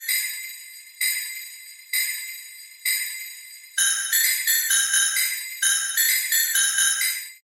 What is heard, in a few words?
Orchestral Sleigh Bells; Drumwavy; Syntheway